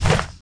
swish; haggy wuggy bot

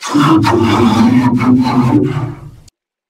jumpscare; blue; The Monster Plane; kolmods jumpscare

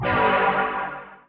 deny magic; The Monster Plane

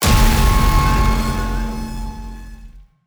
jumpscare; The Monster Plane; kolmods jumpscare; mapbot

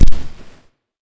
catnap footstep; zoochosis pack

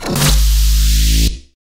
Glitch; speaker woman pack

